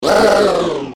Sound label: soldier death sound; ultrakill